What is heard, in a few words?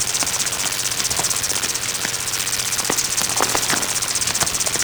Golem Gun Fire Proj Loop; Warframe; Attacks